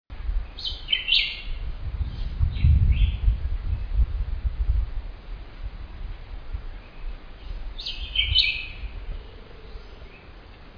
Vireo altiloquus El Yunque National Forest Puerto Rico; West Indian